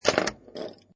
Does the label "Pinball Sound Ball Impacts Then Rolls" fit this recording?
Yes